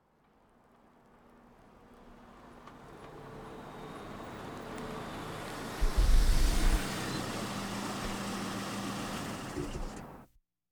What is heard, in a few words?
Automobiles various